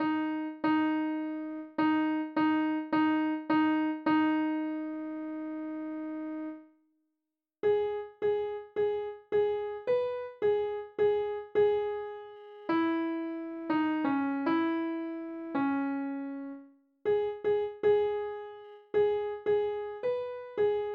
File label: Summer, mezzo, andante; Holst; Mezzo – Summer vanaf Andante